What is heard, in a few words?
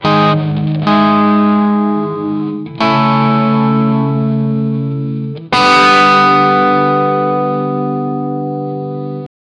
guitar sad; instrument